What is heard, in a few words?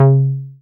303, TB-303 BASS